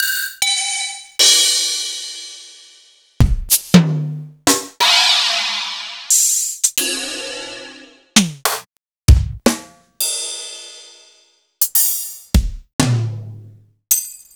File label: Akai MPC3000